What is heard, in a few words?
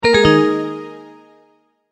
multimedia tone end